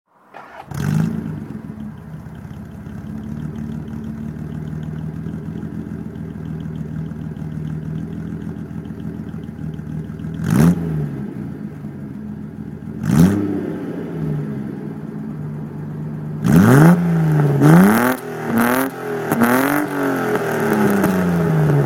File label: 1971 datsun 240z new exhaust